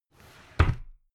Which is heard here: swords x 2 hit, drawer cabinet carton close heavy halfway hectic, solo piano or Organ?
drawer cabinet carton close heavy halfway hectic